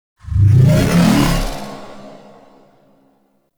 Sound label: UI Theme Login Success; Corpus